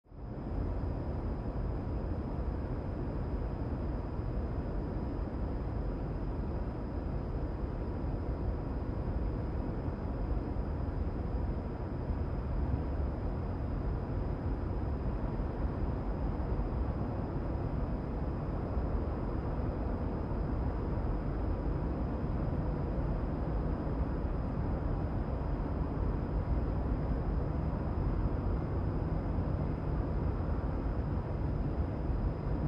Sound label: Night Urban Trucks, ext various